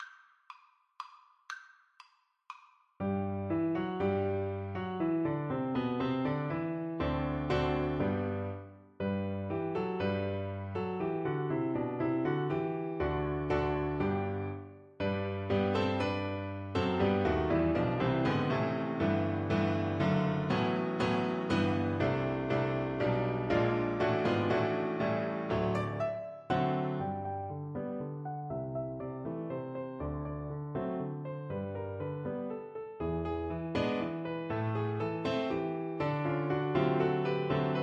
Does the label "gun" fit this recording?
No